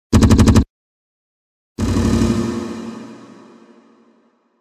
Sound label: machine gun; ariesverb